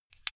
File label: car flip key insert